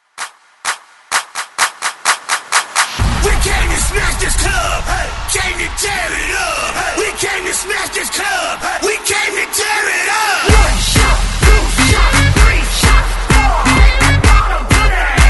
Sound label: 128 BREAK MIX; DJDOLLS